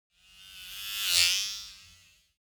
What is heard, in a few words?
scifi mechanics servo small whoosh